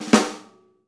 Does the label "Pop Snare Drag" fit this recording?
Yes